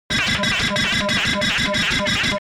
science fiction space power overload

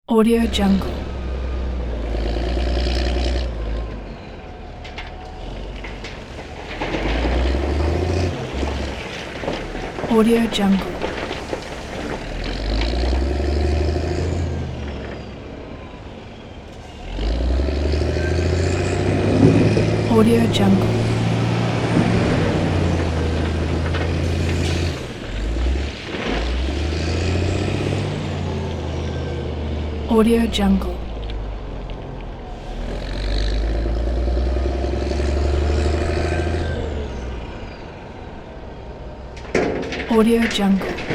bulldozer loading gravel; sound-ideas

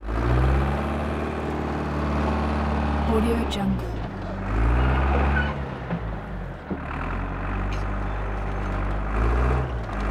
bulldozer international 100e revs

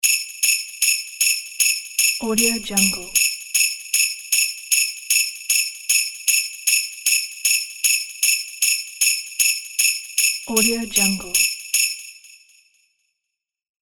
christmas bells

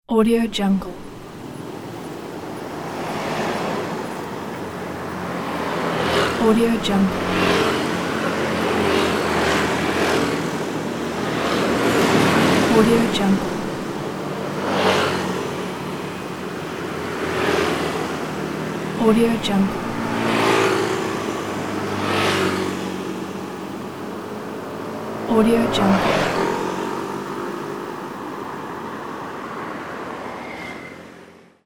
vehicle on the road; dakochan

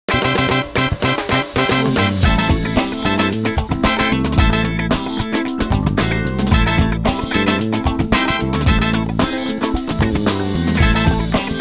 Las Vegas logo

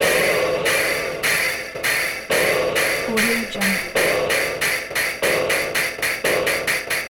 steam engine accelerate air bursts release hiss; prosoundfx

